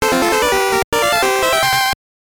Jingle; Tennis; Game Boy

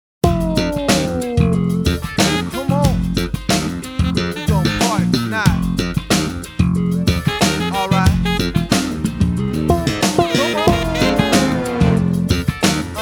Funny Funky; EZ2DJ Platinum Collectors Edition

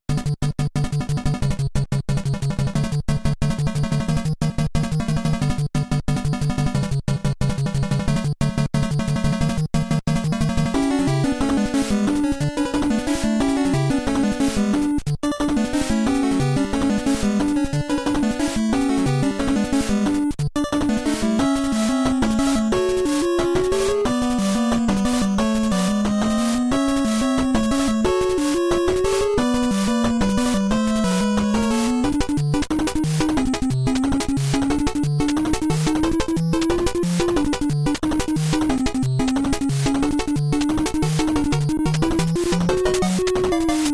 Underground Cave; Adventure Island 4; NES

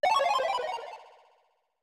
Decrease

